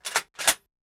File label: gun